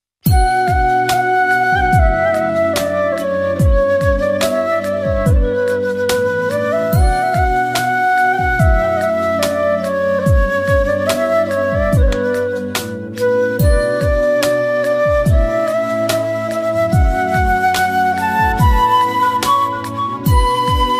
flute ringtone remix ringtone instrumental ringtone